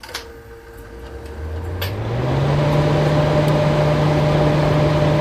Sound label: Dehumidifier Loop Start, Fan Running; Fans Big